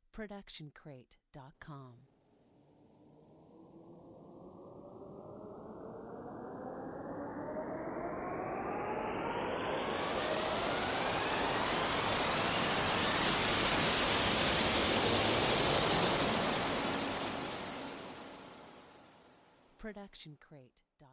Cinematic Riser

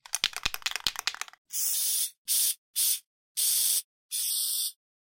spray paint; soundscrate

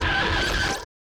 distortion hit; SoundsCrate

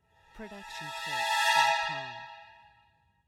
high dissonant cresc

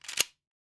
Shotgun Open; SoundsCrate

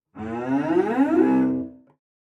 Cello Slide Up; SoundsCrate

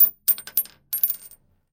Gun Shell Drop; soundscrate

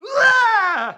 man scream fear; soundscrate

